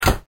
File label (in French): Fermeture Placard